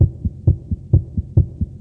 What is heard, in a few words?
HEART; Heart Beat 2